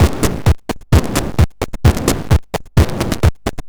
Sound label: Crushed Beat 130BPM; musicradar